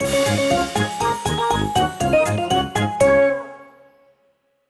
win big; Fondant Favourites StarTrail; Eyecon

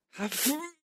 vox tall Guy throw; Highland Reels

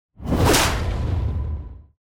VS sfx; Lucha Rumble

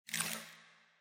frozen Block line Win in; Champions of Valhalla

